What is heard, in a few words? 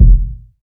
reverb kick drum e key; kick drum one shots; kick drum samples; drum single hits one shots